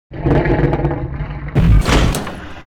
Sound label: die, Mob